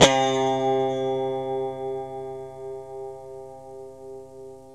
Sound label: GTR KINK, Clean E.Gtr, Hookmonics; Roland LCDP02 Guitar and Bass